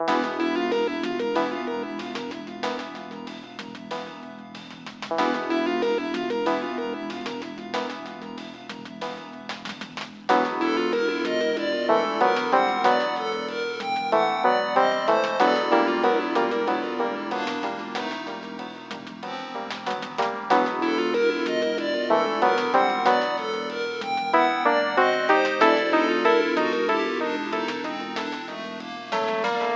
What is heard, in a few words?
precaution ringtone